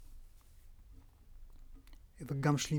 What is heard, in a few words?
Tape GTR